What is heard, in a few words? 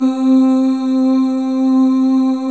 Male Ooz; 90s Sample CDs; Club-50 - Foundations Roland